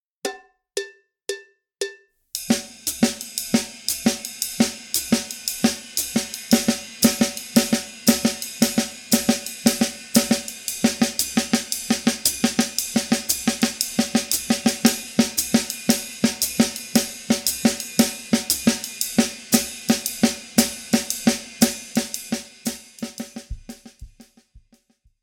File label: Comping, Jazz Latin; Schwarzes Album; Bum Bum Tschack